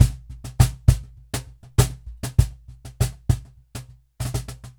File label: Cajon Loop 100BPM; musicradar